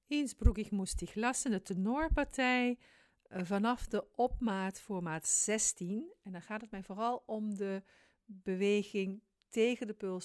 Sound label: tenor; innsbruck fragment